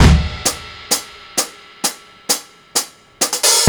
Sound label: hats, drums acoustic, 130bpm, metal drum; musicradar-metal-drum-samples